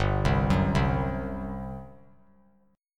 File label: G Major 7th Suspended 4th, G, down